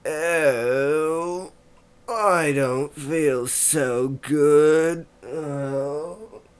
poison; wizardwars

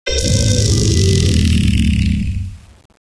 deny leveling; svencoop; scxpm

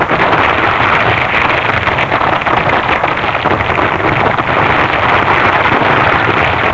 turn, tank

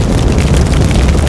fireball ambience, spells; wizardwars

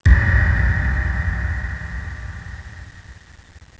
creepy; svencoop

